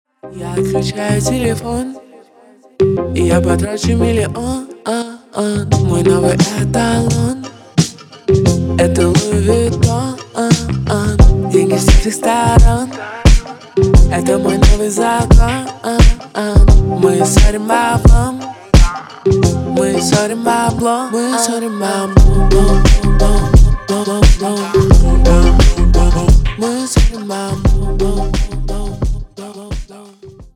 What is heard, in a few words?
slowed remix